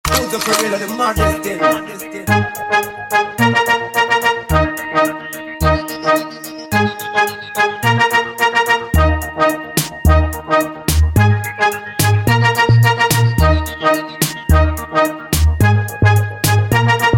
Riddim Instrumental

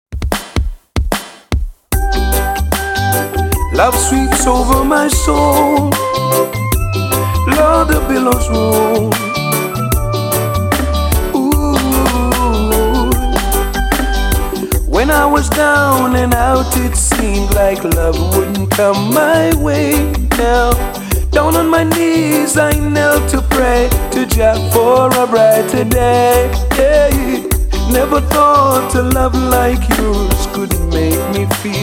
riddim

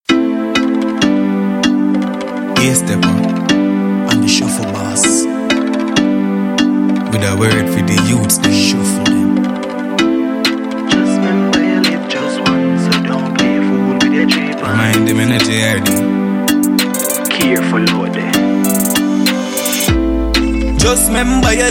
RIDDIM

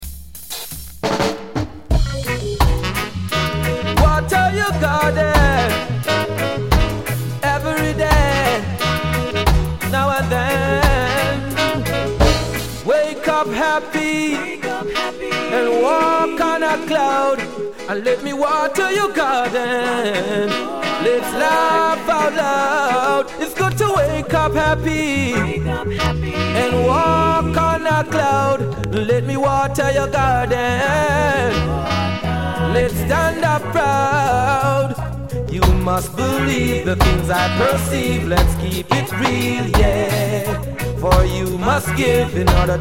RIDDIM

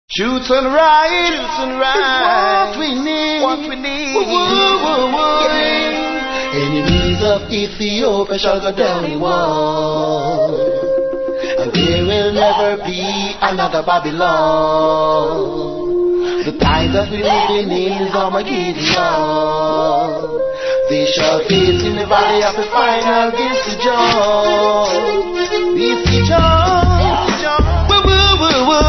reggae riddim

